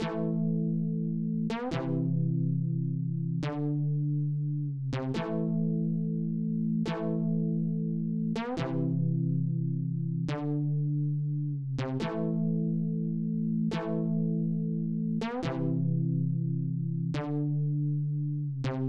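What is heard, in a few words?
melodic synth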